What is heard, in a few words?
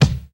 Airy Kickdrum Sample C Key, Royality free bass drum sample tuned to the C note.